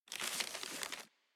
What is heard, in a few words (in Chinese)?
纸声